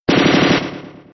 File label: machine gun